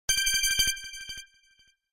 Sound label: Samsung Galaxy S10 Ringtone